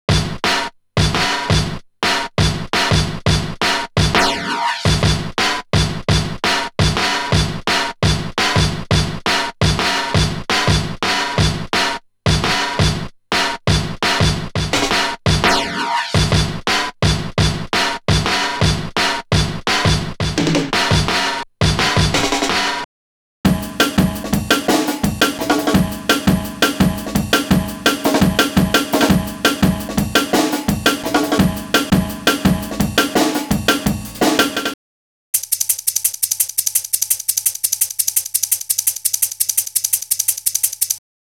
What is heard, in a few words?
breaks, Jungle; Zero-G Jungle Frenzy 2